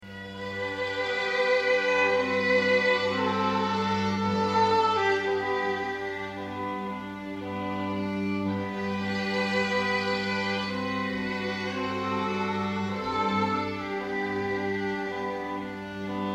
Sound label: strings; panis angelicus